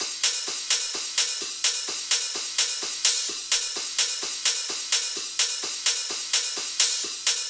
Loops 128BPM, Drumloops

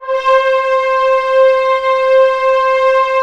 orchestra C4 PP; Sound On Sound - Peter Siedleczek's Orchestra

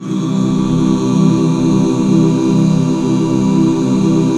C MAJ, Back Chords; Sound & Vision - Gigapack II CD 1

